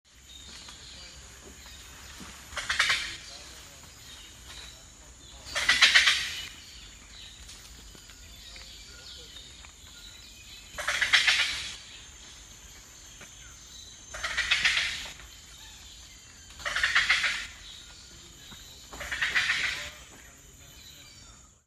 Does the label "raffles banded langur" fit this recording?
Yes